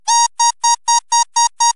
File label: Aircrafts Cockpits RWR Threat New US; DCS